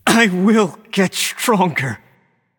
boohoo; smite

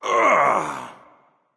lift; chiron

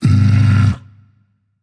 nv tongue; smite; hachiman

